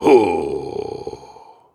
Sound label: Grunts and Chants, Viking Vocal